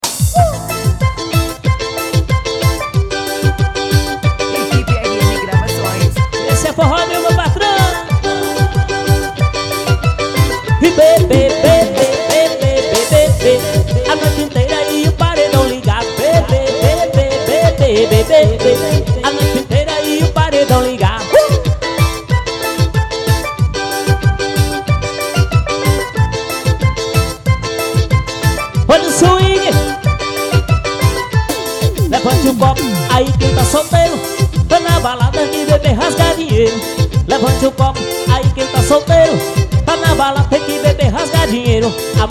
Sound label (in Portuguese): forró ao vivo; rmgravacoes